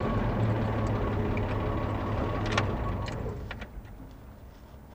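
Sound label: Chevy Truck 46 Idle Off In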